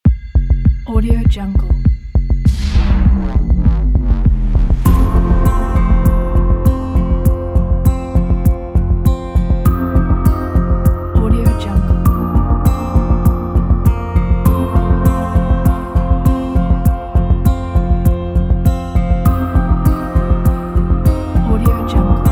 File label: ambient motivational corporate; creativemusicfactory